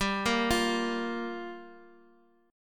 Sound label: G Minor 7th, Guitar, DADGAD